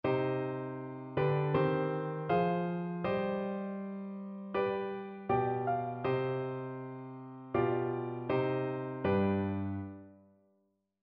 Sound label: Modulation